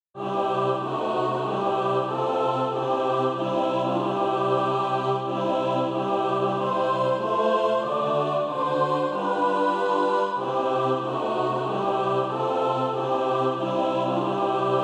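mixed, Sheet music (SATB) including Practise Music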